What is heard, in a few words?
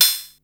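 hi-hat fx open; 300 Drum Machines; Yamaha Motif ES-Series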